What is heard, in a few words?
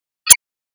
Whine, Cartoon Shell Screaming Whine Down; HB02 - Hanna Barbera